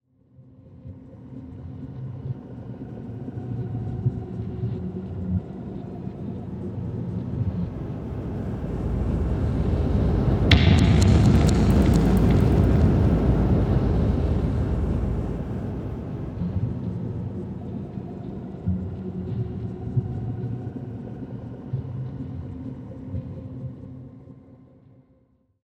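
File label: Reverse Boom Going, Cinematic Metal Impacts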